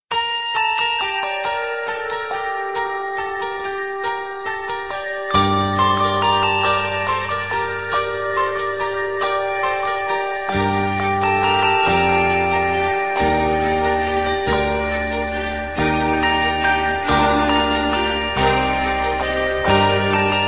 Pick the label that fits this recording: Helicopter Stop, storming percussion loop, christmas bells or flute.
christmas bells